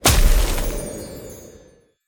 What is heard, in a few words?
Grenade Snowball Explosion